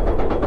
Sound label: medium transition, roller coaster; Dreamteck; Splines